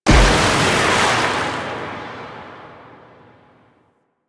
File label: Cannon Blast; Warframe; Warframes; Pirate